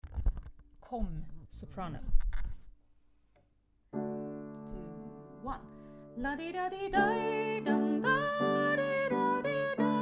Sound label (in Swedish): sopran